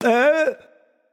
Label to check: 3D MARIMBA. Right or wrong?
Wrong